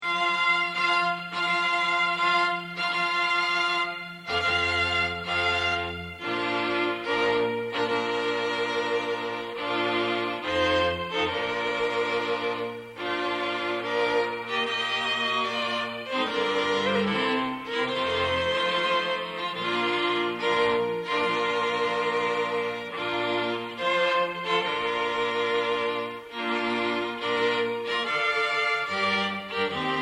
Wedding March, string quartet

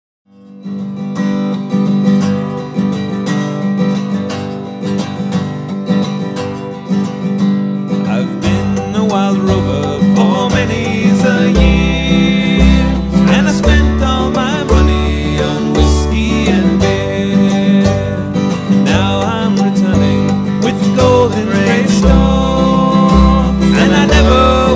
vocal, Celtic band

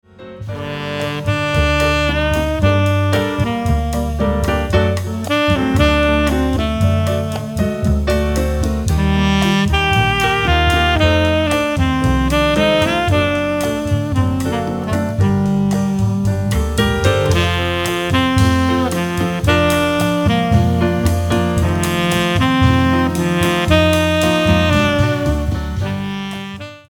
Aussie Bossa piano sax quartet; jazz

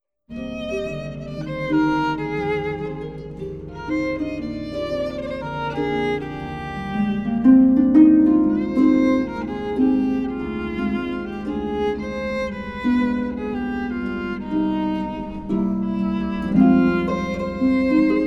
violin and harp; Catherine Boyd

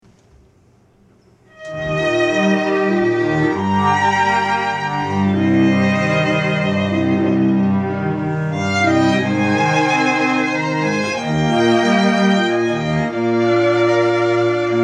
String Quartet

